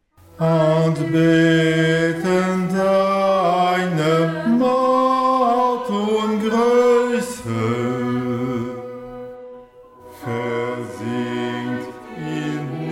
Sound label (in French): Basses; Anhang